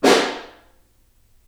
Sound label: SN Far, Snare